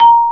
MARIMBA A#4; AKAI S-Series CD-ROM Sound Library VOL-8; SET#1 C PERC

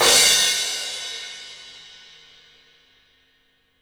dark, Studio Cymbals; E-MU Producer Series Vol. 5 – 3-D Audio Collection; 3D Percussives

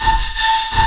technosyn2, Techno Trance; Ueberschall; SYNTH

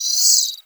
LI L BIRDY, CARTOONY; Spectrasonic Distorted Reality 1

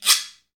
Guiro Wood; Club-50 - Foundations Roland

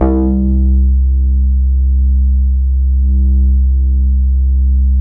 BAS C2, 2 Step; USB Soundscan vol.51; House Side Of 2 Step; AKAI; BASS

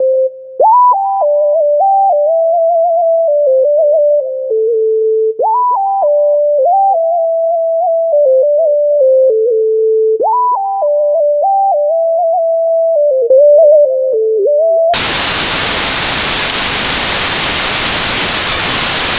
Keyboard

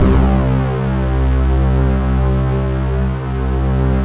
SQ1, Synthesizers

